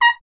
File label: BRS TP A#0XL, Trumpet; Solo Instruments; Miroslav Vitous